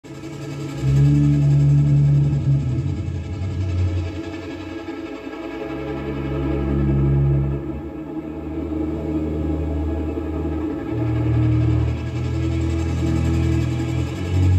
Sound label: Ambs; Resurgence